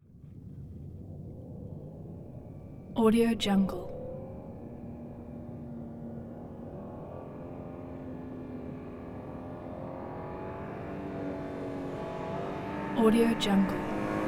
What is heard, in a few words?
2002 bmw m3 car uphill pass by; prosoundfx